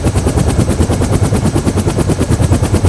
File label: idle, comanche, monster